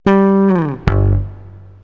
FENDER, guitarras

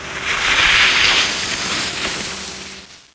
Puddles

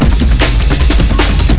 BEAT; CHASM

